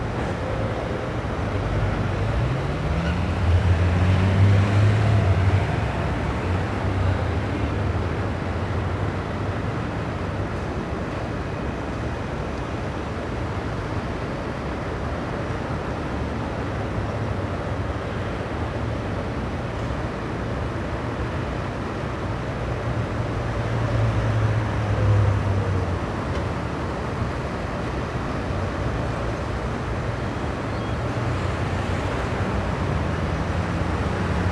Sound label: ambience; Street Hoops; XBOX